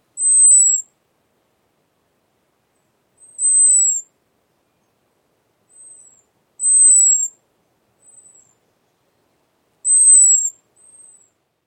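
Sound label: American Robin hawk alarm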